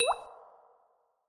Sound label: Phone Notification